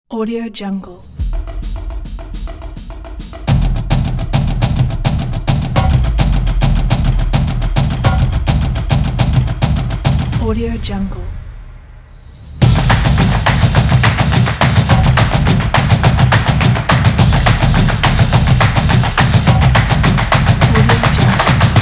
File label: the drumming